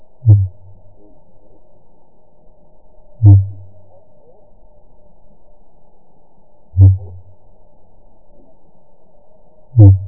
song fin; x5speed

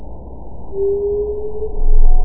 ping amplified echosounder

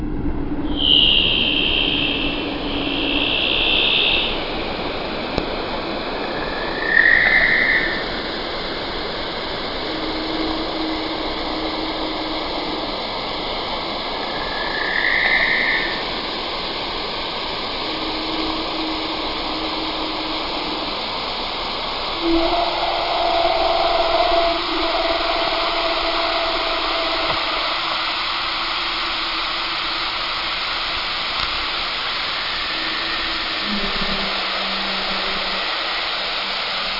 Multisound leopard seal

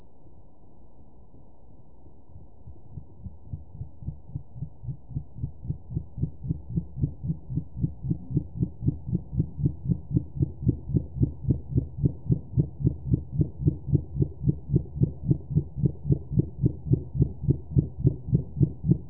pulse trains minke

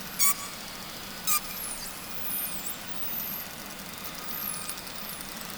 multisound bottlenose dolphin

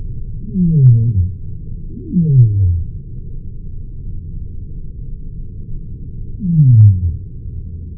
downsweep x2speed sei whale

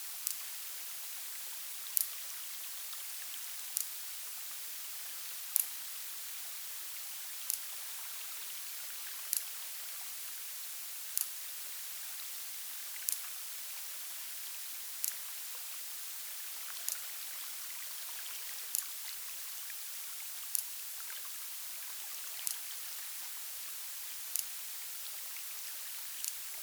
clicks 0.3x HPF12kHz cuviers

